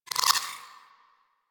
Sniper Zoom Out; Warframe; Tenno

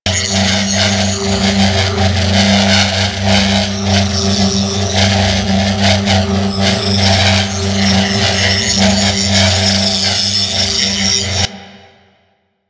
Orb Malfunction Spin Loop; Ambience; Props; Warframe; Orokin Derelict

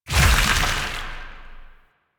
Void Release Heavy; Weapons; Warframe; Duviri; Incarnon Adapters

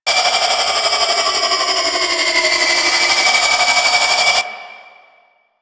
Infested Alad Collar Proj Loop B, Attacks; Warframe; Infested Alad V

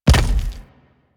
Obelisk Stone Connect; Warframe; Infested Eris

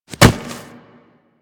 Generic Mantle Foley; Characters; Warframe

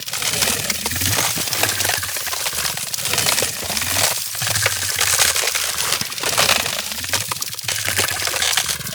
Ice Loop; Warframe; Weapons; Corpus; Crp Freeze Ray; Tenet Glaxion

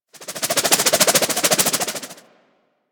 Glaive Idle; Warframe

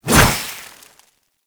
Hover Whizzby Angry; Warframe; Pax Duviricus

